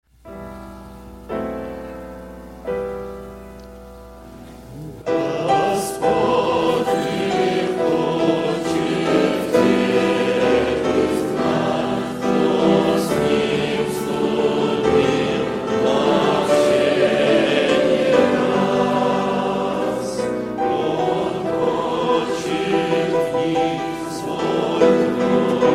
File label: song; Gospod ne hochet